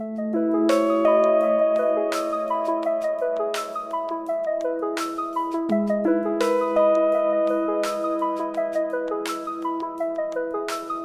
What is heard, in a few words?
pause loop; Komori - NeastroelB (SeVIl) (Optimized + BTMC Edit)